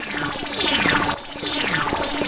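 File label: Arpeggio Fx, 105bpm; rhythmic inspiration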